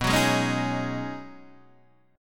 B 6th Add 9th B Guitar Open D, chord

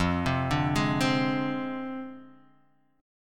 D-Minor 7th Flat 5th, F

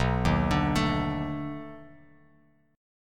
B 7th Sharp 9th, down, Bass Standard; arpeggiated

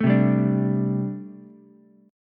A Minor 11th A Ukulele C6, Chord; Am11 strummed; JGuitar

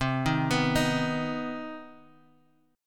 C Minor Major 7th C; arpeggiated; JGuitar

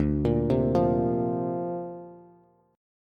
D-Minor 7th Sharp 5th D Bass, chord; Dm7#5; arpeggiated

